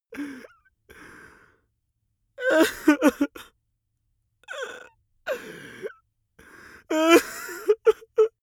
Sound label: male crying; soundpool